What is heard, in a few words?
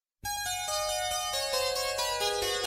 Message Tone